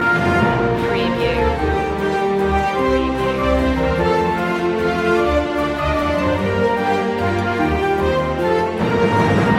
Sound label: we wish you a merry christmas orch loop; media music now